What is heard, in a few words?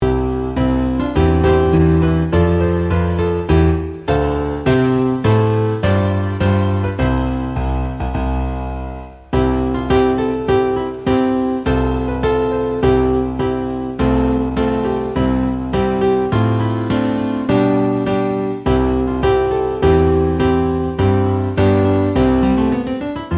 accompaniment